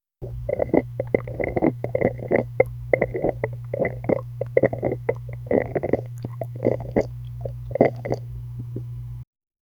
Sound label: slowly gulping pudding